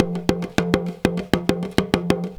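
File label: small tama groove; Best Service; World Colours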